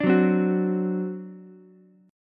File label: C Suspended 2nd Flat 5th C down Ukulele; Csus2b5 strummed